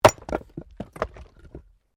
Wood Chopping Axe; Objects

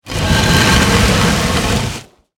Crypt Door Close, Rock, Movement; Horror; Monsters; Blast Wave

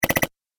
Game Driller, Video Game Sound Effects

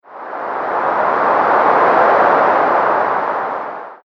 White Noise Loop Filter; Loopable; Andrew Fleming

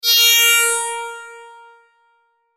Chime Musical, Chime Musical Multimedia; Synth; Multimedia Web Interactive; Chimes; Blastwave

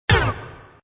Synth Shot Mid, Single synth hit; Hit Sound Effects; Sound Design

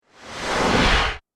Laser Whoosh; sound design

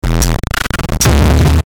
Distortion Glitch Power Down Stutter; Blastwave

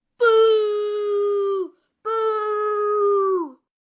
"Boooo Boo", Boo, 1 boy, 11 yrs; Booing

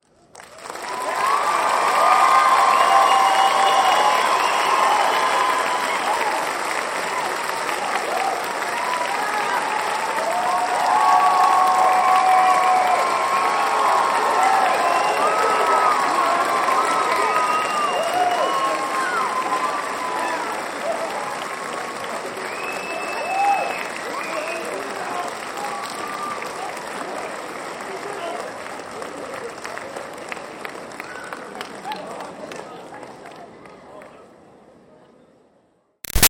Crowd Applause Cheers Audience Grad Big; Auditorium; Graduation; Applause (Sound Effects:Human); Big Room Sound

